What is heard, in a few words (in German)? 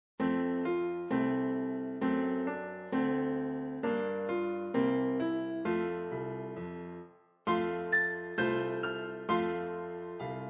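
Jingle Bells Klavier vierhändig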